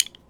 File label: Foley